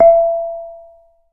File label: BURMESE G, BURMESE GONG